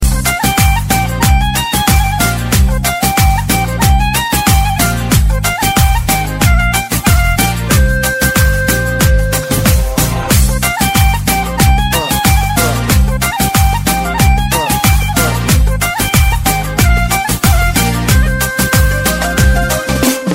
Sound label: best arabic flute ringtone